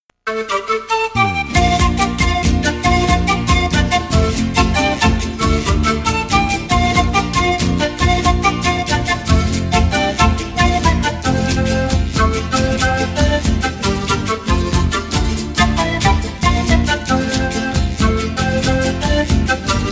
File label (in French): flute des andes